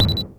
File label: Bullet Bill Homing